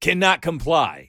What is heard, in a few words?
negative, Voices Emotes